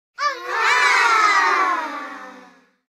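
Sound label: sound of children's delight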